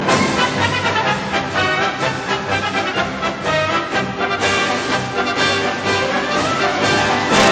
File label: Circus Tone; Iphone4